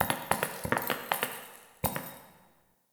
Idle Prance; Tagfer; Warframe; Ambience; Entrati

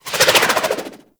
Sundial SMG projectile; Warframe; Tenno; Weapons

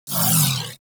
Crewship Turret Proj

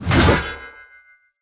Great Sword Throw; Weapons; Player; Swords; Mendicant Great Sword

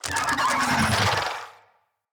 Infested Big Ashen Vocal Attack Death

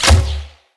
Bow Ranged Shot; Weapons; Player; Envoy Hunter; Bows

